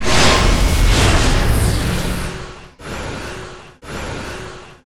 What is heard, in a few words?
Magic buff